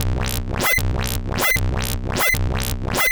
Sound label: Modular Synth